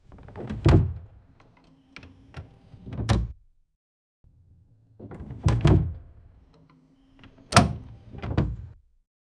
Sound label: one shot wooden hinged, interface